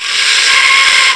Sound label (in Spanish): ruido